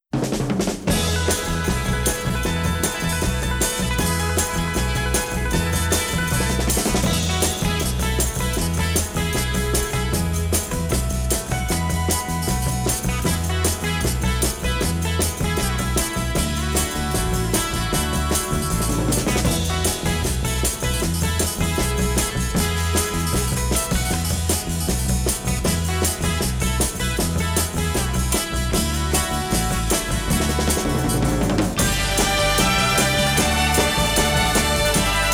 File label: orchestra; space odyssey; countdown media